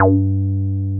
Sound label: 303 RES G3, RES BASS